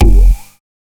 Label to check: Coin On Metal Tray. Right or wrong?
Wrong